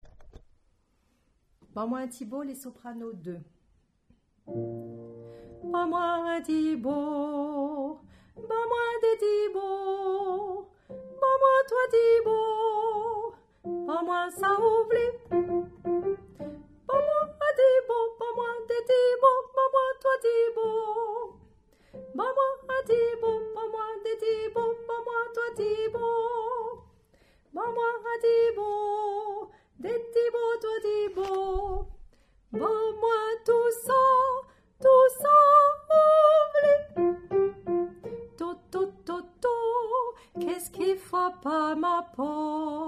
Soprano

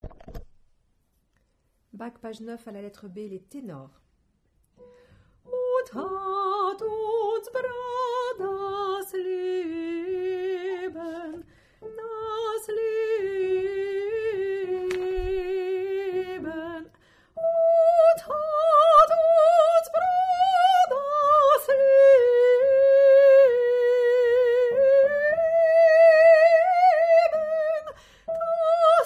Tenor

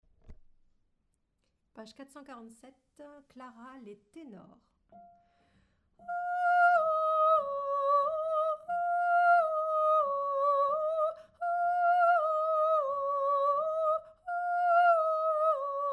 Tenor

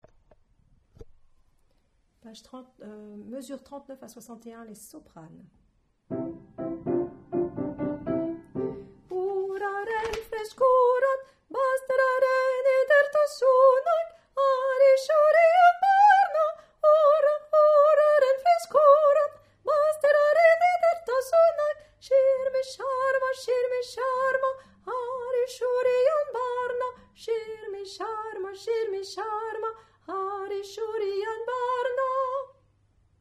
soprano; Xirmi Xarma

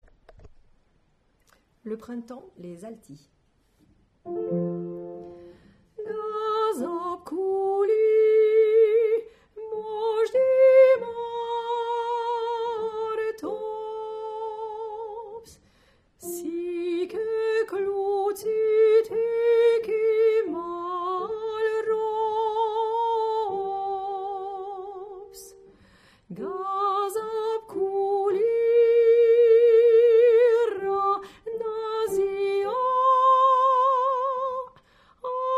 Alto

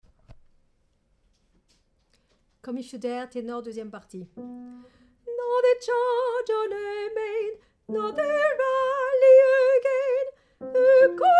Tenor

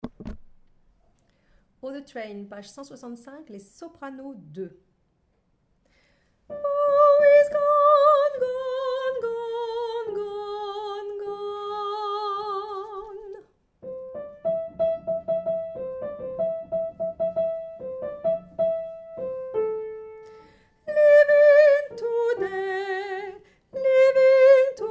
Soprano

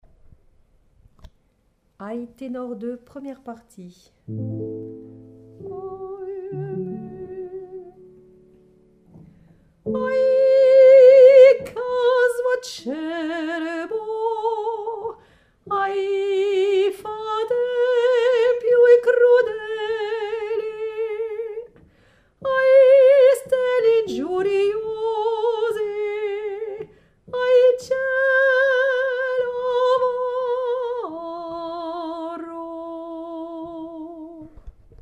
Tenor

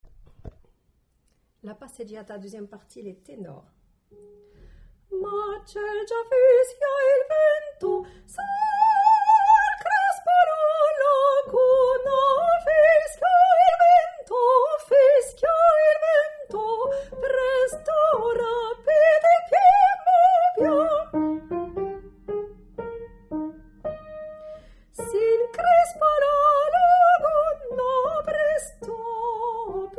Tenor

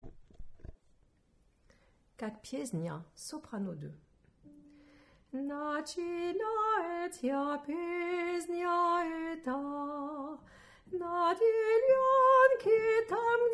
Soprano

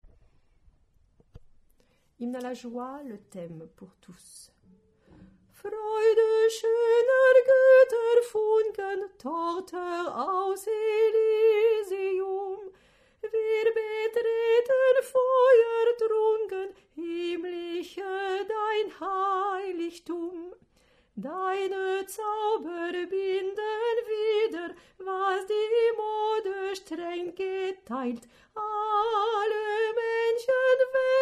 theme Tutti

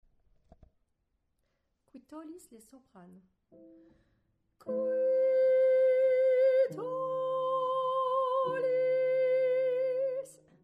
Soprano

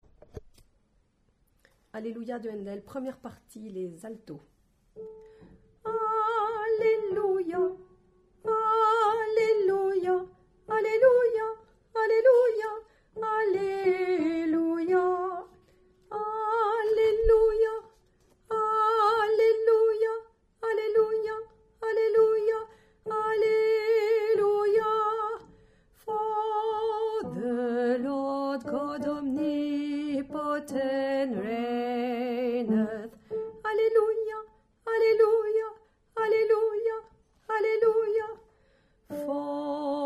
Alto

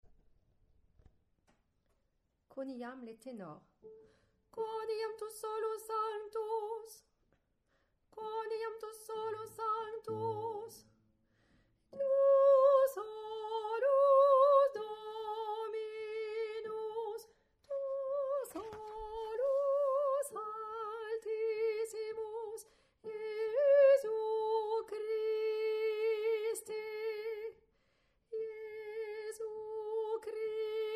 Tenor; quoniam

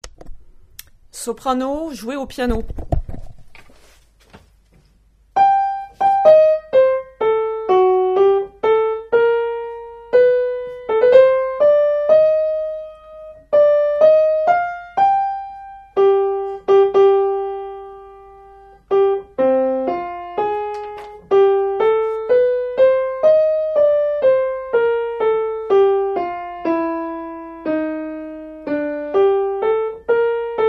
soprano Piano; libera me

